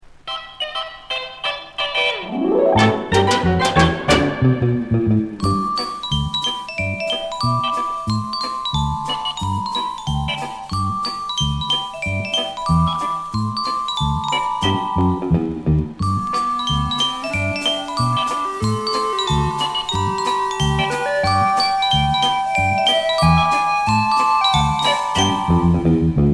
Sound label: orchestra